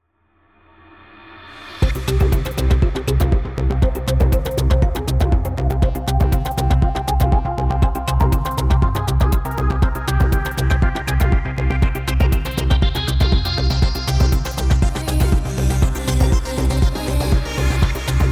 Bonus Music; Cosmic Crazy Cash

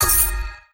Flash Win Value; CosmicCrazyCash; ClubFusion

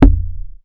Cash Bag Reel Spin Stop; JackpotDarts; ClubFusion

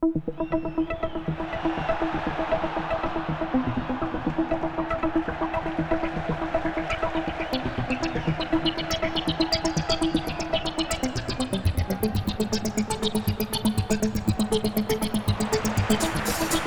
Music; World Of Sport Quiz; Golden Boot

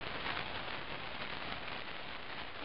Bomb Fuse; CashBomb; ClubFusion

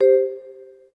Bonus Flash; PubQuizKnockout

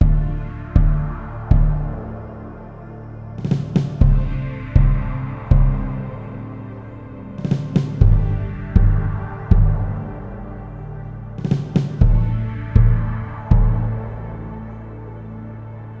In Game Tune; Take Your Pick

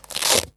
cancel; LuckyPunch; G-Star

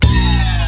Bonus Wheel Symbol; JackpotDarts; ClubFusion

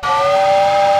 steam whistle; MexicanaChillitime; ClubFusion

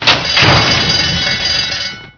Start Bell; RacingRiches

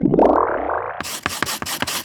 Game Over Chalk Write; ItsComingHome

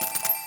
Cash Out; ClubFusion; Showtime Classic

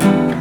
Guitar; ClubFusion; Mexicana Chillitime

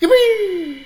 Correct; OverTheBrink

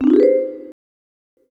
Correct; LuckyPunch; G-Star

